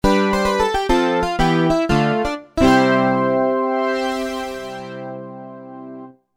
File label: Juno Harpsi; V-Synth